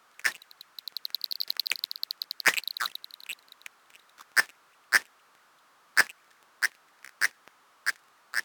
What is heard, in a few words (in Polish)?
Karlik większy